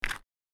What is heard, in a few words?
Stone Destroying; My Cute Commissar